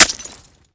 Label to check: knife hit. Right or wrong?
Right